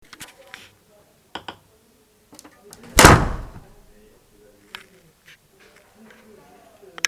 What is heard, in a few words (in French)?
porte qui claque